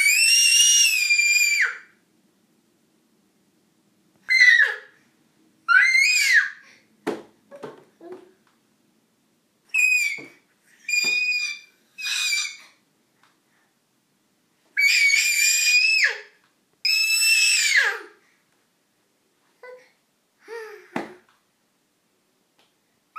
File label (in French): Cris